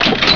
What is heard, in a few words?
Hole OUT; Loony Labyrinth 1.02 Demo; Arcade